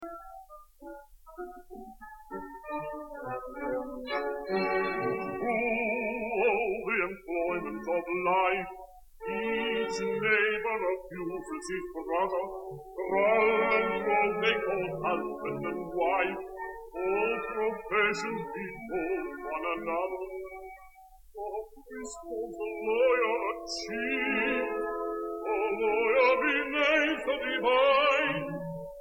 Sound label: air